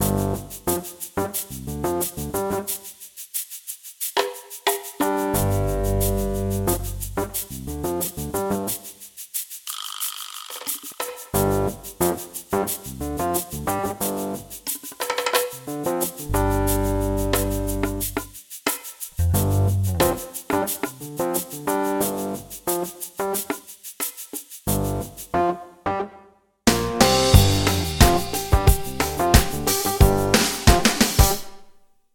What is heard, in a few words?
Lets Go Percussion